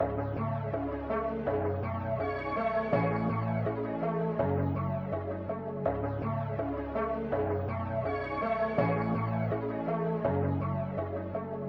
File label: 164 BPM